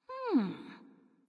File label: thinking; smite; artemis